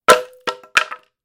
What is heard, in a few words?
Plank Drop Sound